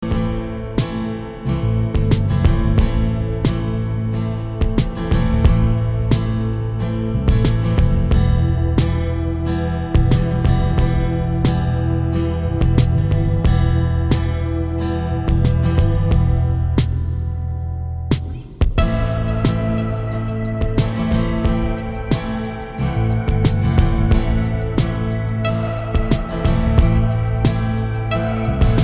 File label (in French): nanana guitare violons